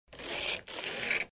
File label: steel scratch; The Witcher Hunt